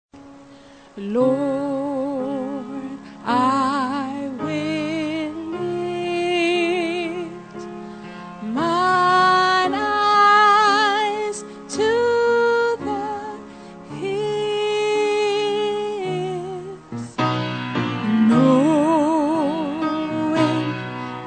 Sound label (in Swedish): tenor, stämmor